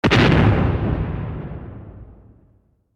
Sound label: Blow; Troll Face Quest Unlucky; Android